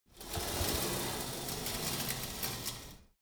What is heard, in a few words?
pullup machine cable pull, fitnessstudio; sport freizeit